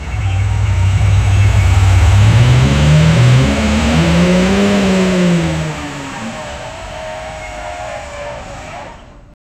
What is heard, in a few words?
intense close mic sound, race